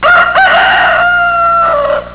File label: rooster